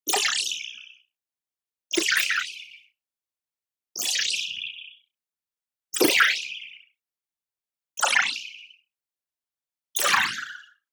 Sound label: movement fast, robotic granules; boom library; Anime Essentials; DSGNMisc